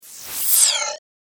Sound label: Into The Time Tunnel, spacecraft sound effects; star wars / trek style games; Andrew Fleming